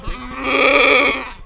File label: sick